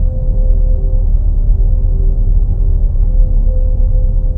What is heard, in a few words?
ent hallway; trek